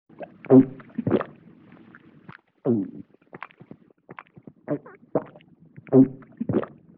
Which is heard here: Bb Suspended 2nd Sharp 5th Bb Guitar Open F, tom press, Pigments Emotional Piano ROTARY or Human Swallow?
Human Swallow